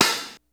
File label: hi-hat, Hip Hop DRUM; InVision Interactive; 40 Oz Phat Slammin Hip Hop